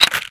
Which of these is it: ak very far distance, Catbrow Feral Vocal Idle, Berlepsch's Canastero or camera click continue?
camera click continue